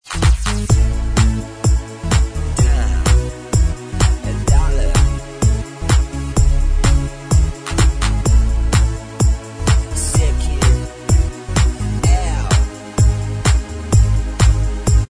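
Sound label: Bvox Track, backing vocals track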